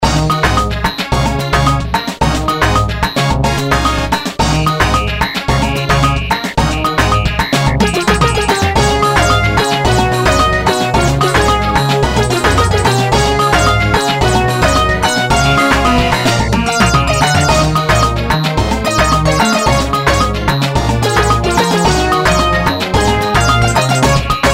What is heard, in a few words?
Samba Soul, Latin